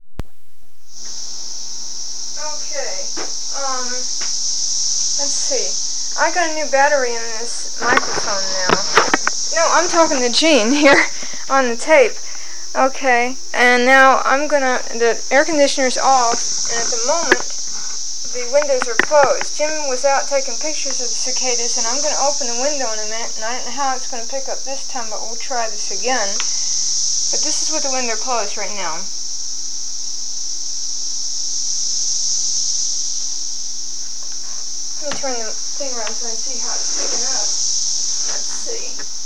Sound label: cicadas